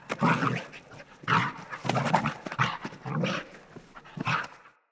Pack Wolf Run Grunt; Animals

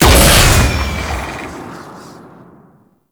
Rocket Launcher Fire 3D; Warframe; Weapons

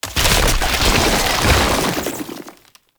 Tank Infested Charge Recover; Enemies; Abilities; Warframe; Lasrian Tank

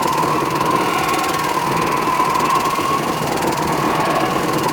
Dark Loop; Warframe; Warframes; Harlequin

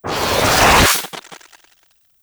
Berserker Cast; Warframe

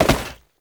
Step Metal Perforated; Warframe; Oraxia; Foley

